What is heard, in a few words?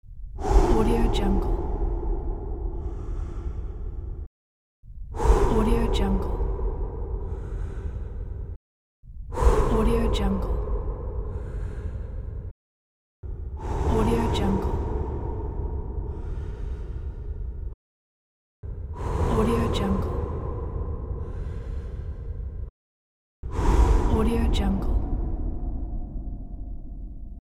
breathing echo